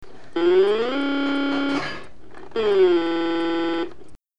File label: accelerate decelerate